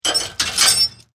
Chain Metal Clanging

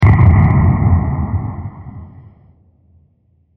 Huge Distorted Explosion; Explosions (Sound Effects)

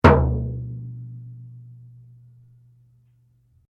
Bodran, Irish styled flat drum; Drums; Musical; SoundEffects-Foundation1

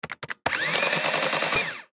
Printing Calculator Press Button Print Paper; Printing Calculator Press Buttons Print Paper; Printers; Office; Blastwave

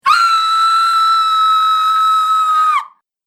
Scream Human Female; Screaming Sound Effects

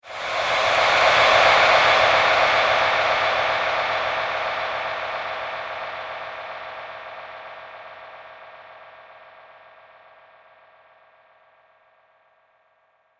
Ghost Graveyard Sweep; Ghost Sound Effects; horror; Andrew Fleming

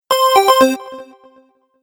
Stage Level Complete, Video Game Sound Effects

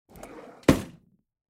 Drawer Kitchen Close; Utensils; Drawers; Household; Blastwave

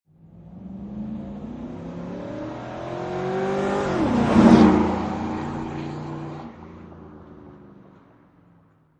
Truck 4.7 Liter Engine Drive By Right To Left; Auto, truck, motor: 4.7 Liter Truck / Lorry: Drive by, right to left

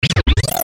Chopper Hard Flange Record Scratch; Blastwave; Chopper Sound Effects

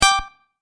Tap Play, button; multimedia

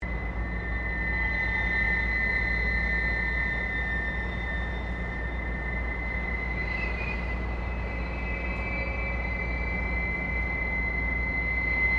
Wind And Resonance In Apartment Building; Weather

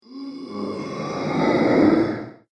Ghostly Halloween Monster; Ghost Sound Effects

